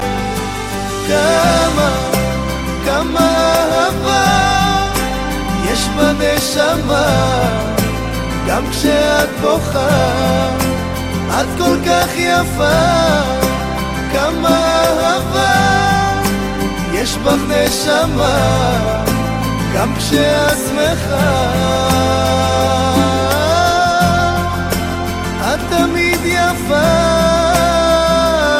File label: beautiful love song